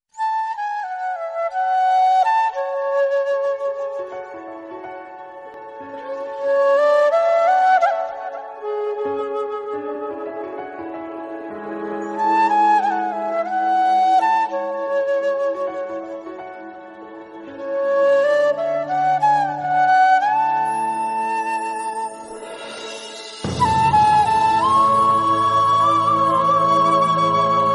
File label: instrumental flute love bgm theme flute ringtone; rhtdm; krishna